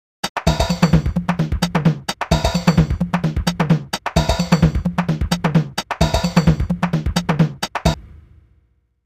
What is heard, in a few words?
drum